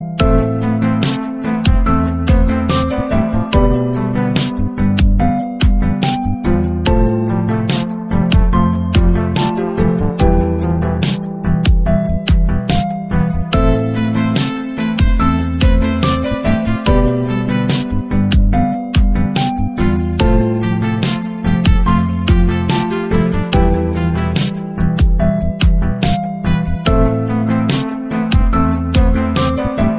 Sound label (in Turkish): Duygusal Zil Sesi